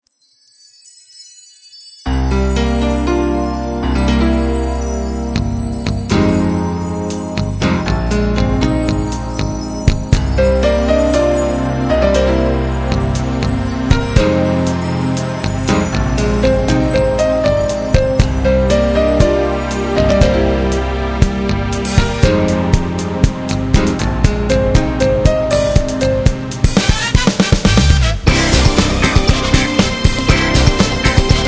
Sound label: Music Castle